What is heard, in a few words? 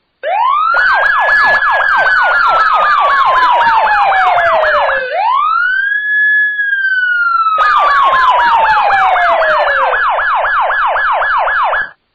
Combo Wail Yelp